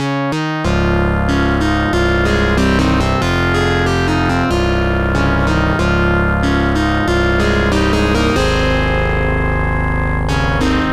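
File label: background music; Side Arms Hyper Dyne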